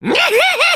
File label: laugh; terumi